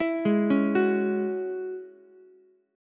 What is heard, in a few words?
F sharp 7th Suspended 2nd, Ukulele; F#7sus2 arpeggiated